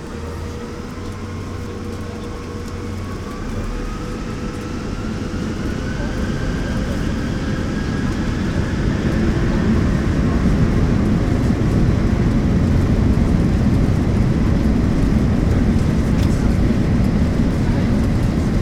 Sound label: takeoff inside; air; best service Blue Box